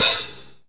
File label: METAL HH; rashdemo